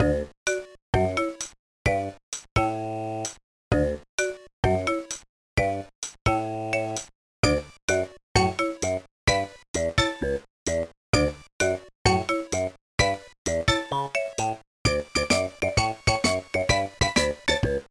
BGM; animal mania dokidoki aishou check gba

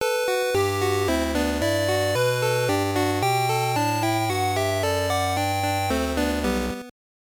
Jingle; animal breeder 3 1999 gbc

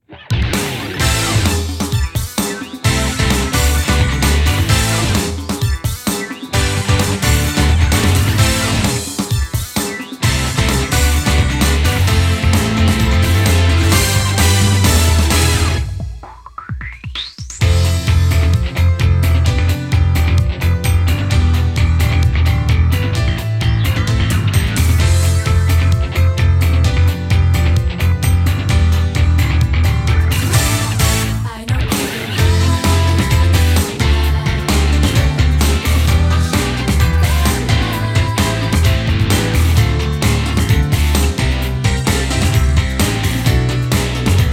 off vocal; KID BGM Collection Vol.5; Memories Off After Rain original soundtrack

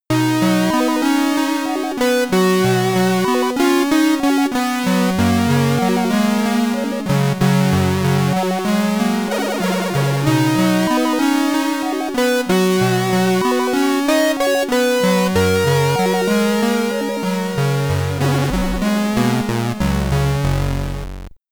Game Over (SID Stereo); eon commodore 64

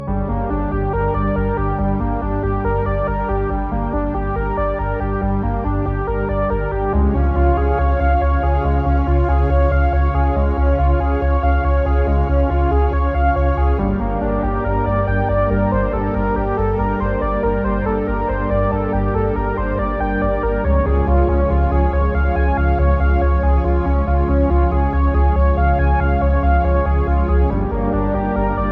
Space Ambience; hiberworld android ios online windows gamerip 2019

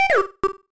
Changing Sound

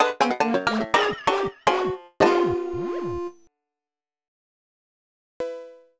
Mission Failed; Spore Hero Arena; nds

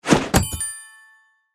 Tutorial Book Appears; bad piggies; android; mobile; windows

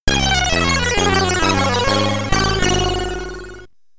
Get Ready! (SID Stereo); Kings of the Beach; Commodore 64

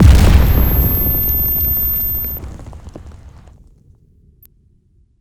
Explosion

